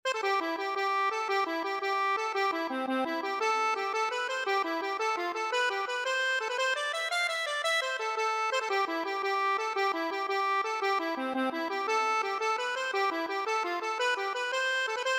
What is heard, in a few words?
accordion